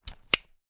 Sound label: foley life jacket clip fasten